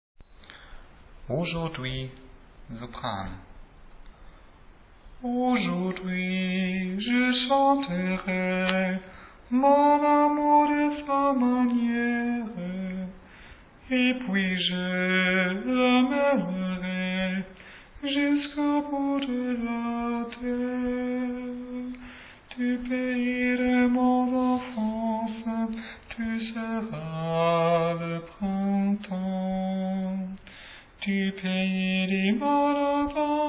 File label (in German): Sopran